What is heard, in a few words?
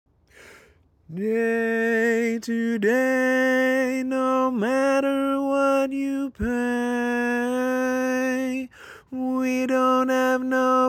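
baritone, barbershop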